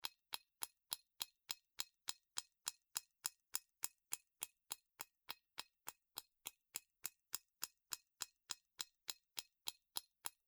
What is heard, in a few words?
Metal